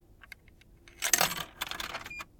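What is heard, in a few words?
coin into vending machine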